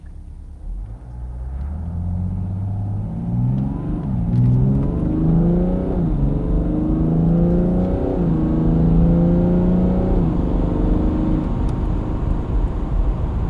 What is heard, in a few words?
bmw 640d gran coupe engine more agressive driving with accelerations interior mono; sonniss gdc